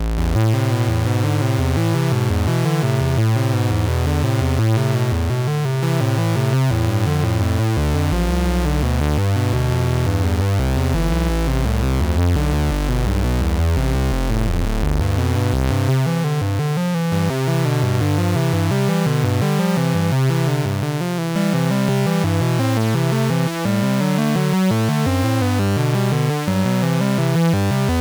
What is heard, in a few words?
vco 4069